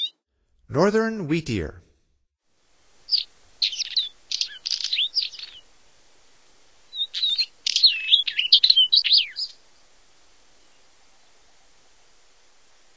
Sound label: Northern Wheatear, Songs of Birds of North America; Stokes